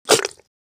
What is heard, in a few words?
mud